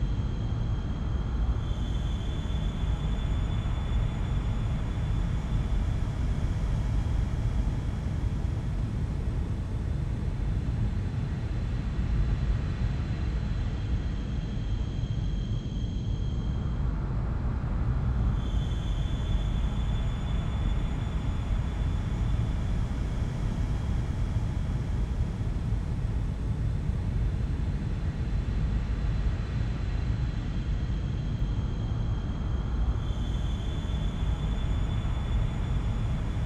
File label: Amb Castle; Orcs Must Die